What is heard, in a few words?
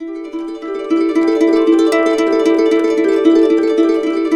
E, Harps; Complete Harps; USB Soundscan vol.62; AKAI